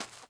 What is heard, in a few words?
footsteps sand